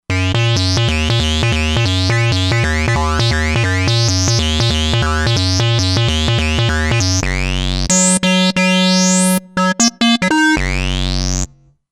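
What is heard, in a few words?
lead Trippy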